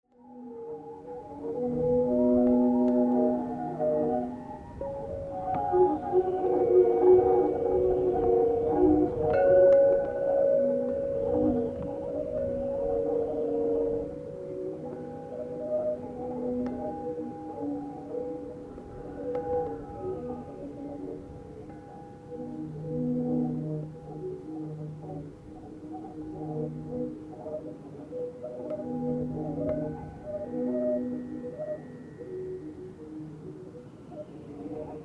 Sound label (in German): nordwest-wind; zoom